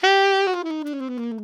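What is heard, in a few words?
ALTO long fall G 3, Saxophones; Greytsounds - Philip Wolfe David Crozier's Saxophones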